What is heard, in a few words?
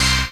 CHORDS; EastWest; Dance Mega Hip Hop Electro